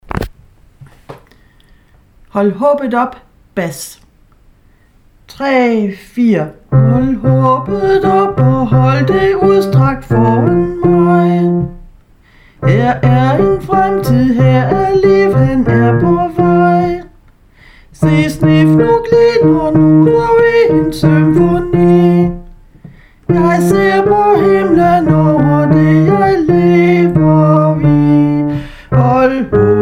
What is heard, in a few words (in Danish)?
bas; hold håbet op